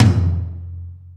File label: tom hall; Greytsounds; Scott Peers Sound Engineering